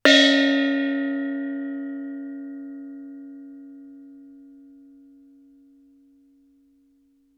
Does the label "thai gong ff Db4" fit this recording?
Yes